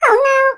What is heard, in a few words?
Incorrect